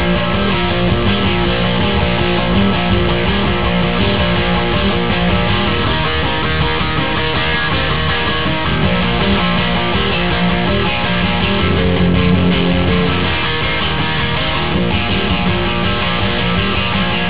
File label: rock